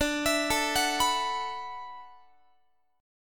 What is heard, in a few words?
D 6th Add 9th D, Guitar; D6add9 arpeggiated; JGuitar